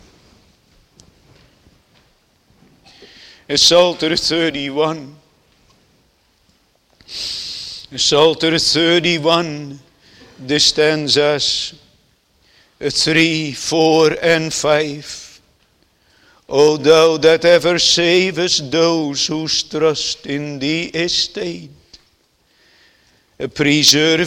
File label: Singing